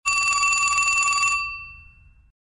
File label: Vintage Telephone; LG JN240 Remarq